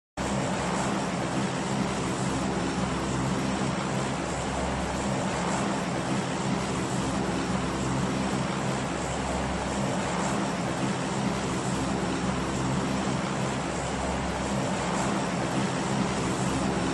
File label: airplane; airdrop